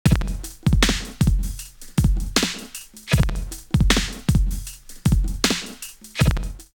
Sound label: Rasta Riddim 78; X-Static Goldmine